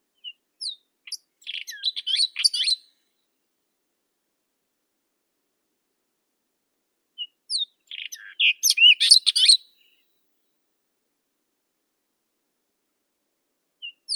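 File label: white-throated robin, Irania gutturalis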